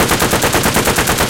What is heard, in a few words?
mg 8mm dt loop, guns; War Thunder